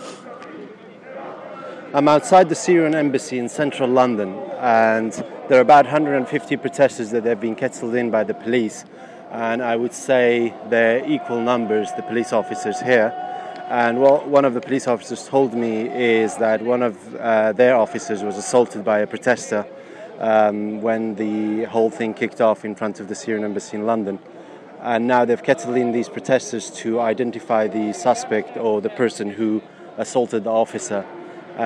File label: protesters kettles in by police; syrian embassy; central london